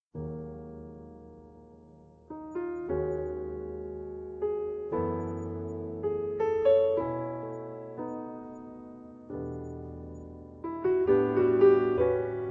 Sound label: Music Box